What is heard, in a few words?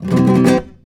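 RASGAND C# major, FLAMENCO Guitars; Spectrasonics; Hans Zimmer Guitars VOL-2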